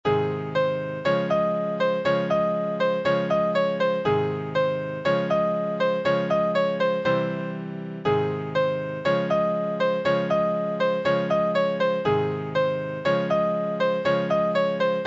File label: Piano